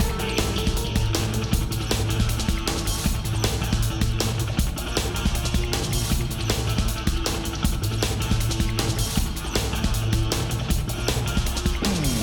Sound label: Tornado Combat MIXED; ben 10